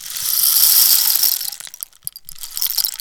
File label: African Rain Stick Pou